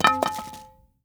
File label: Arrow Fall Ground; Timelock VR